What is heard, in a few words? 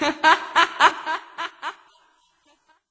Girl Laugh, Vox